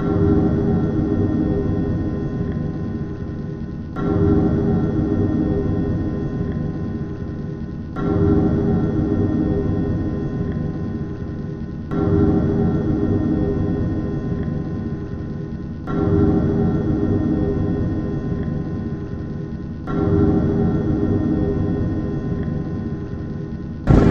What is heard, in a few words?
loop; svencoop; bluegamma